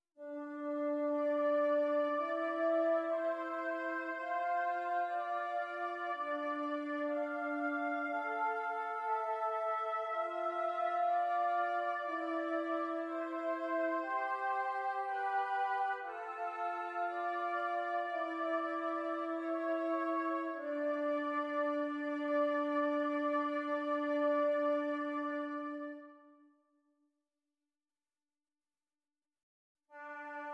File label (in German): Zwei simmiger Contrapunkt; Ronge